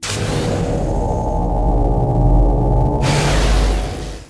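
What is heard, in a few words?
ambience hologram; Star Wars Knights Of The Old Republic II; XBOX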